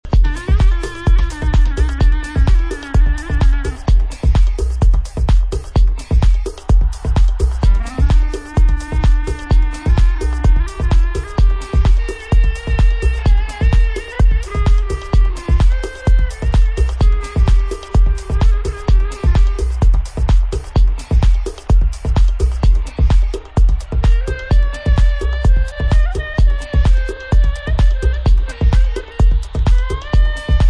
clarinete

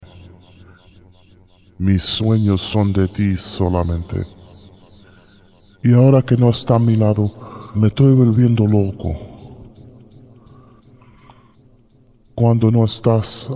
acappella

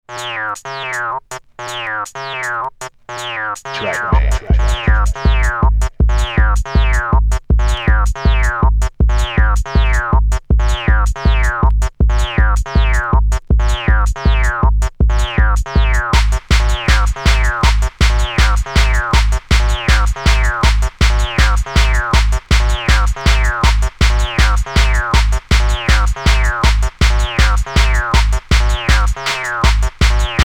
Acid House

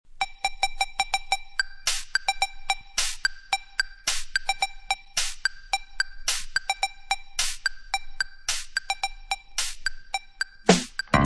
Instrumental; disco

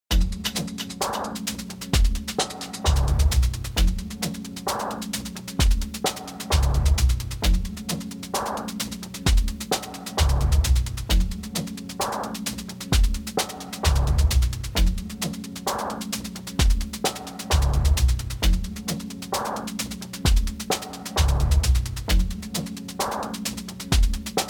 Horn

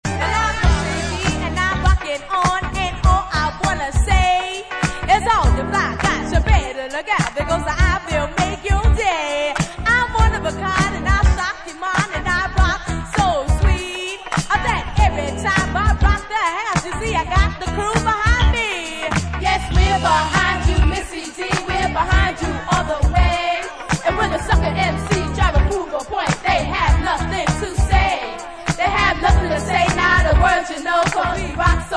vocal

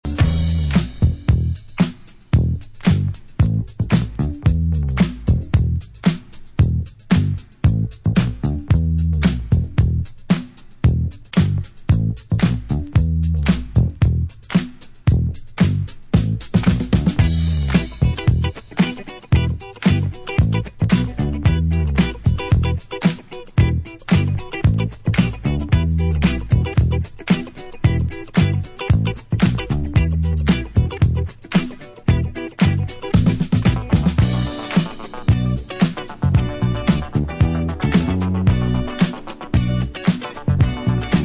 instrumental mix

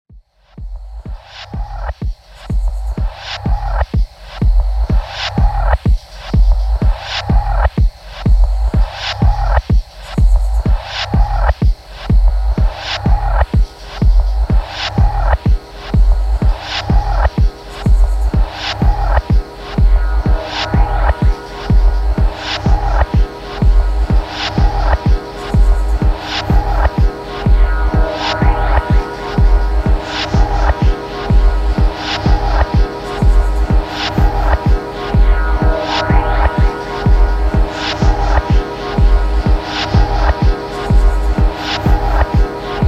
Tribal Acoustic

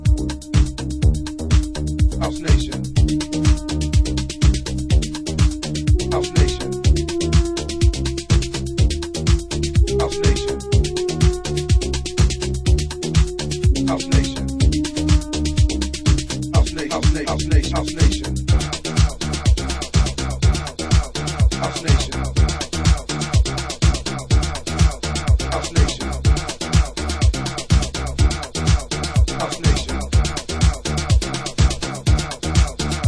acappella house

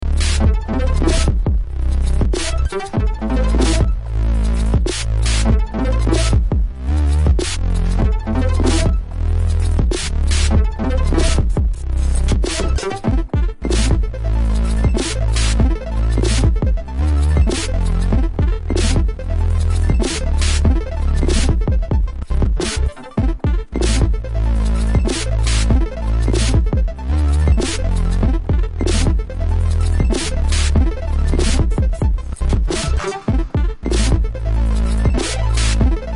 korg a tron

